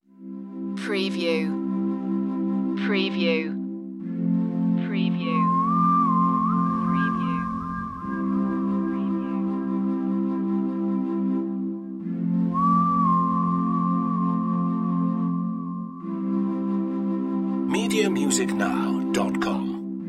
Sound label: beach